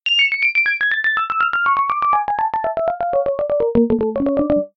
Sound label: synth piano